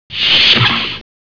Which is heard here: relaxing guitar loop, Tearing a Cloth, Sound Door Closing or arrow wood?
Sound Door Closing